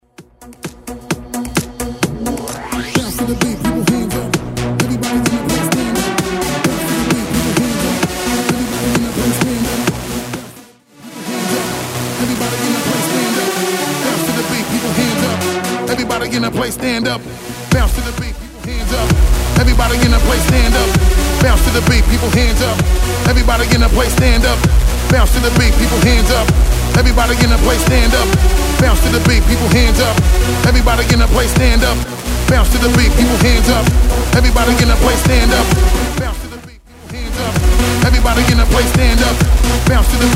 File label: Bigroom 130bpm